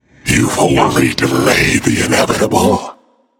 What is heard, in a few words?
death; smite; hades